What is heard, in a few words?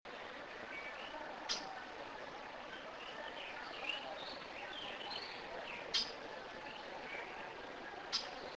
icterus portoricensis aguas buenas puerto rico; West Indian